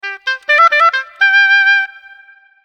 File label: Oboe Con Brio, notifications; Vertu Signature Touch; LSO